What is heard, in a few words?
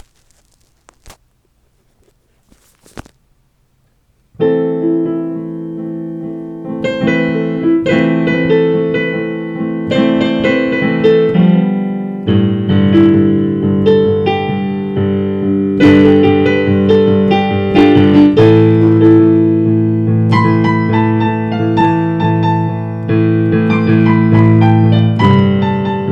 Piano Thang